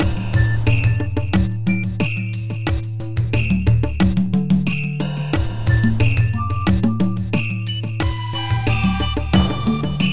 musique

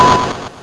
Diamant Pierre; Balderdoush 1.04i

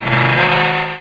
Avion; alone in the dark 4

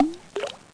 plouf; Emme Arc En Ciel Et Le Lagon Merveilleux

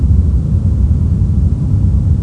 vaisseau; Big bang

